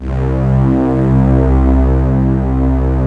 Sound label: ANLG STR, ANALOG STR